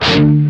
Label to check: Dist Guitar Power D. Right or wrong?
Right